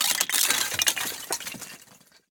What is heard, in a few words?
ice shatter glass